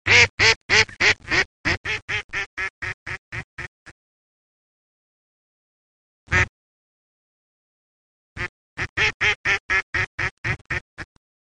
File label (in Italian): Germano reale